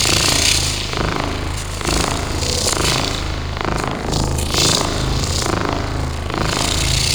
Door Machine Loop; Ambience; Props; Warframe; TheNewWar; Zariman

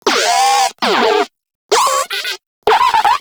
Moa Compassionate Vocal Player Down; Pets; Warframe

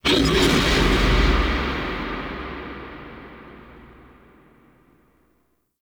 Strike; Leverian; Styanax; Tharros Strike; Warframe Powers

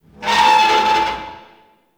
Destruction Resonant Metal; Ambience; Warframe; Corpus Railjack

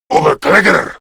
Surprised Grineer Male; Warframe; Battle Barks

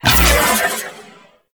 Proj Fully Charged Whoosh; Weapons; Warframe; TheNewWar; Omicrus

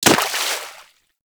Melee Impact Water

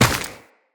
Run Dirt, Footsteps; Tenno

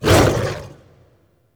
Axe Weapon Swing; Wolf Frame

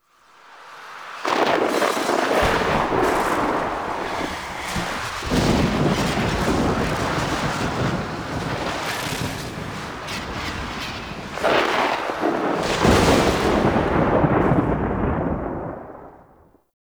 Purgatory Ambience; Parvos Lich Intro; Cinematics

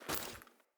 Foley Run Default, Default Clothing

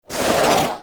Speargun Throw Windup Foley; Warframe; Weapons

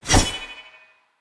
Long Sword Whoosh Heavy; Weapons; Player; Swords; Pothia

